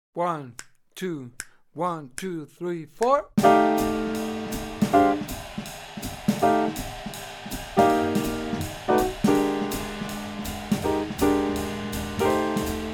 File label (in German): blues in f dur play along